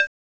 Saw F#3

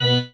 marimba grave; ゆめ2っき

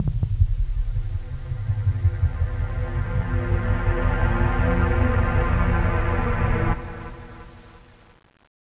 kowai sound; ゆめ2っき

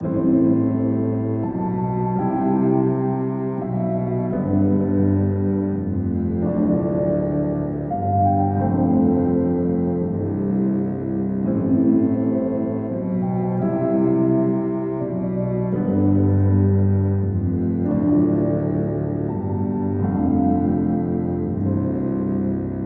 faint light; ゆめ2っき

